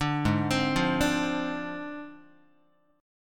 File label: G sharp 6th Flat 5th G sharp Guitar; G#6b5; arpeggiated